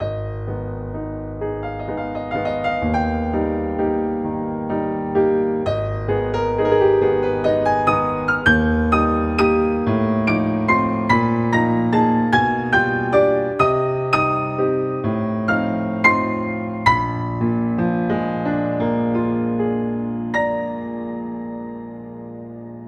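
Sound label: chromatic nocturne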